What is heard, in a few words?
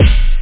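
big bassdrum hihat & cymbals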